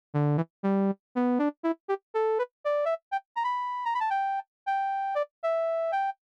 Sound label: sub sample; ewi reason sounds